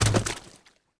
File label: bodyfall mud, foley